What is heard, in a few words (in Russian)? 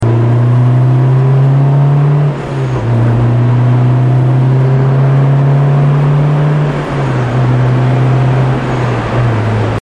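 длительная бесперебойная работа двигателя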